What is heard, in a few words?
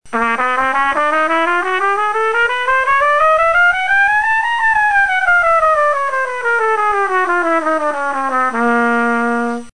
chromatic trompet